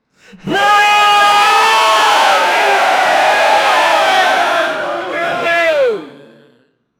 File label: gavel bang crowd yelling